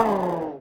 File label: screams human